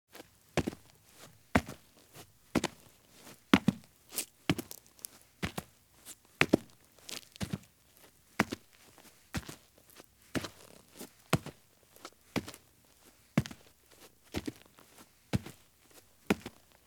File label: Heavy Boots Walk at Medium Pace on Dirt; Sound Ideas; Footstep Sound Effects